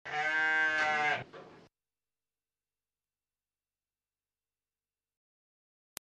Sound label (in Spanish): oveja